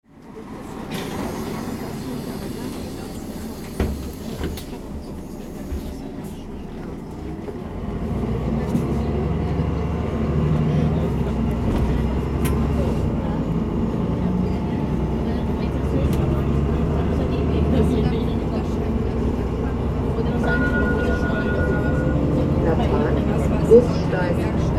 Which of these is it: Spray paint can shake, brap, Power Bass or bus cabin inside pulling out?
bus cabin inside pulling out